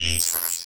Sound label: Science Fiction Sci-Fi Electronic Zap